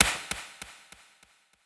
hard slap

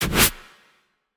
throw charged

